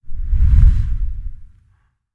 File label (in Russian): zvuki s effektom peremeshcheniia videokamery